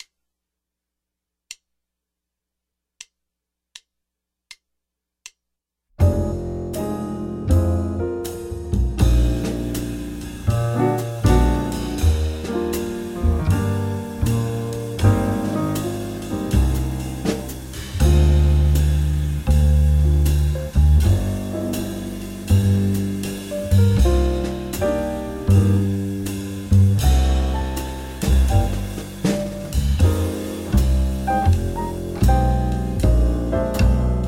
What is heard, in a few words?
(Cm) 8 Bars Loop